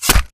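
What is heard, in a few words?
impact bullet generic headshot human; Titanfall 2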